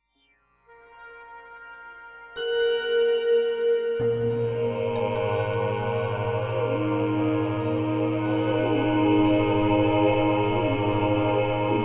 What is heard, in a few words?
Male Choir